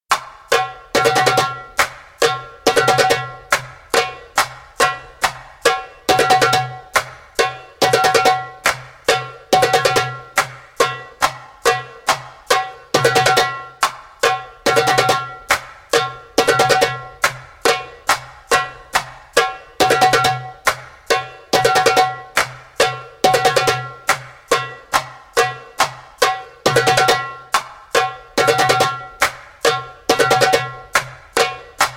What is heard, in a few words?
Awkward Accents (slow) spoken, Djembolale; Djembolale - Fills and Drills